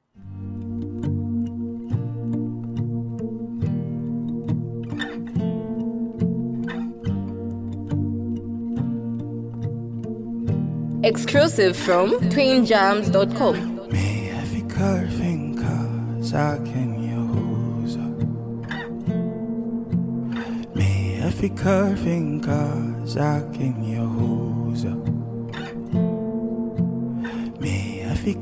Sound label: Acoustic Cover